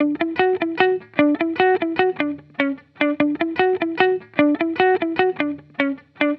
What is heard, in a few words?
Electric Melody Loop - 150 BPM D Maj; Cymatics